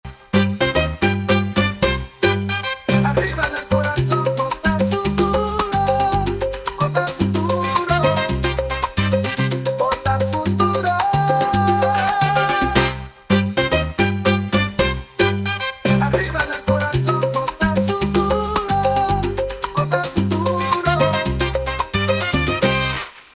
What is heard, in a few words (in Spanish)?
SALSA